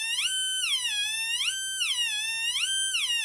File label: ldr synth